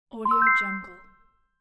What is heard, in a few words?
point sound